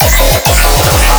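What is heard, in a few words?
Bass Rhythm Reverb 200 D#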